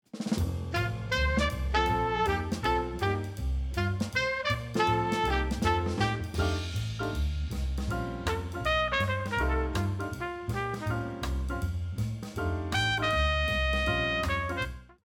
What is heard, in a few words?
Medium Jazz Theme; KCTS